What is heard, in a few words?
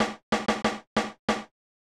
Tile Match Rhythm; Shanghai 2 Dragon's Eye; snes